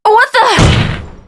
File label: faceplant; blake